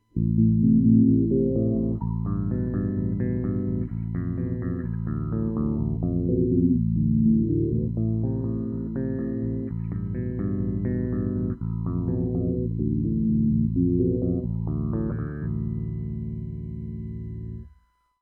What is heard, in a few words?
wah phaser; Sonuus